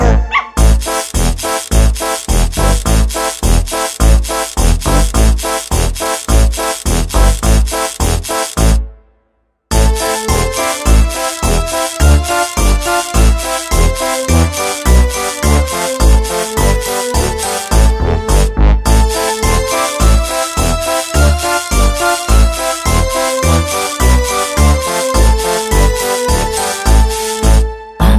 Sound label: lullaby